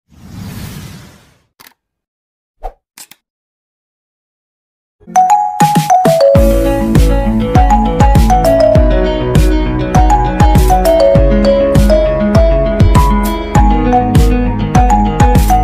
ringtone instrumental

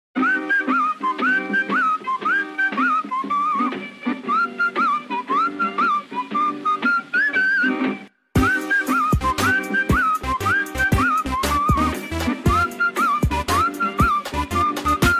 bgm ringtone attitude bgm; mgr

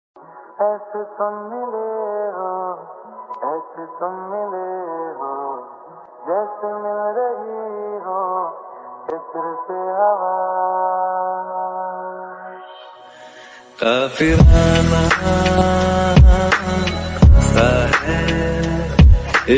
slowed reverb song

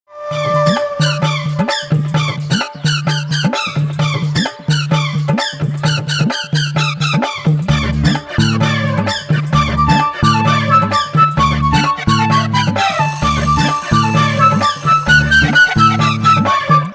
background music, theme song; Baal Veer

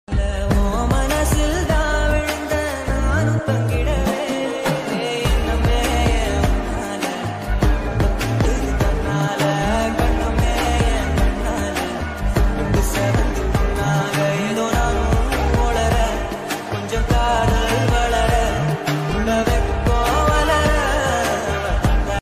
slowed love ringtone bgm

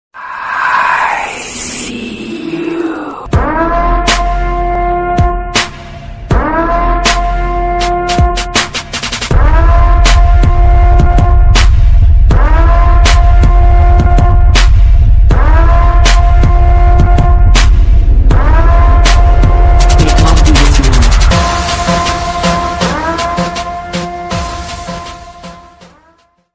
instrumental ringtone soothing ringtone

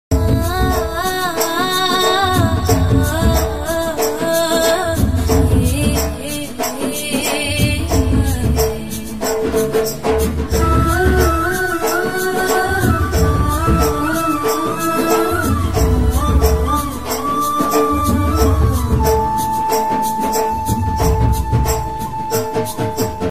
love bgm; surya; sruthi hassan

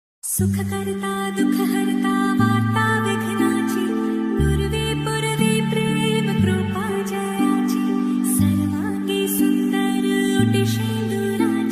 marathi ringtone; ganapati bappa morya; ganesh ringtone; devachi ringtone

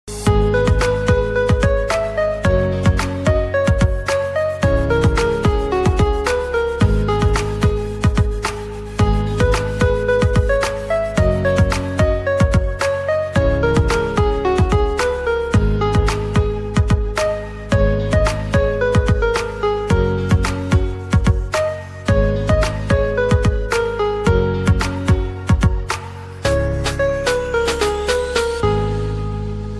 instrumental

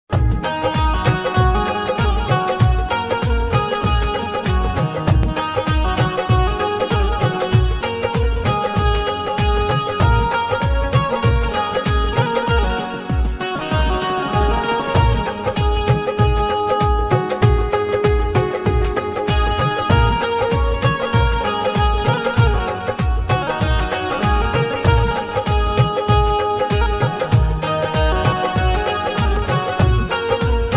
instrumental ringtone

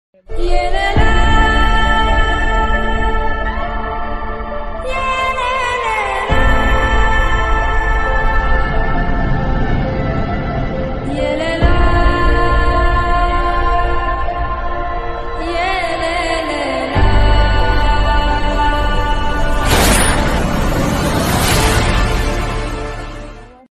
movie bgm ringtone background music ringtone; antim the final truth

